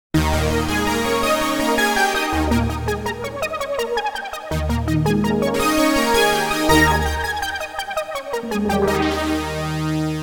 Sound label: Analog Stax, v-synth